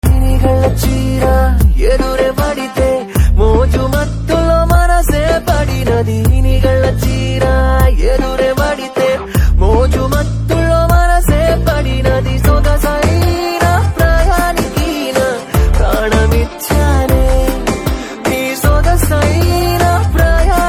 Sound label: Ringtone